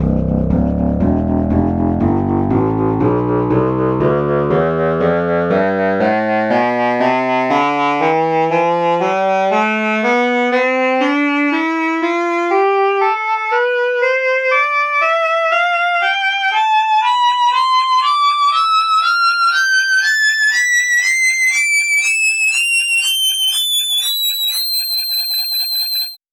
alto sax tonleiter

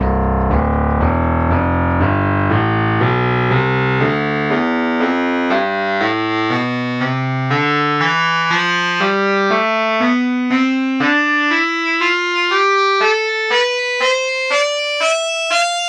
baritone sax tonleiter

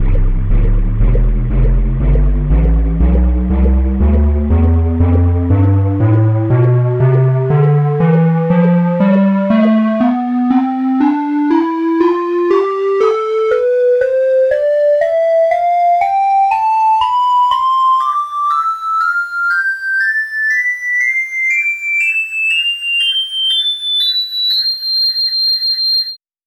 ocarina tonleiter

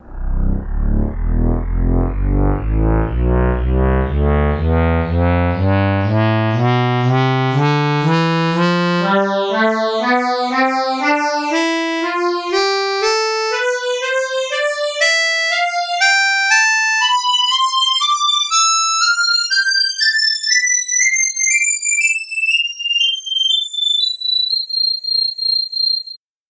bandoneon tonleiter

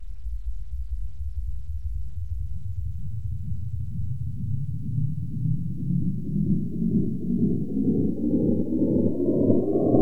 breath noise tonleiter

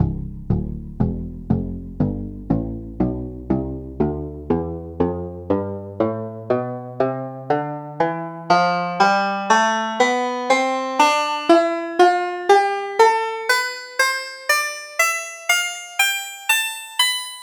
banjo tonleiter

